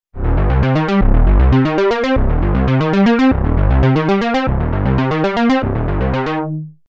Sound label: ARP Unison; Europa